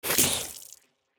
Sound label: Spiters